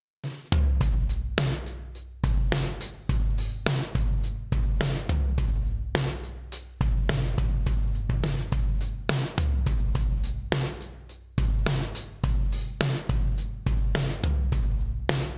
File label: All Button Drums Vintage